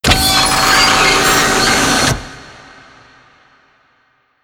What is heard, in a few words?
Platform Rotate Off